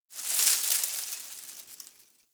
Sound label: Tree Shake; Ambience; Interior; Warframe; Orokin Derelict